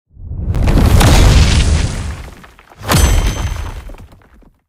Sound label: Tank Death Sequence